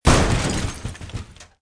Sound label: sound effect car crash; road rage tank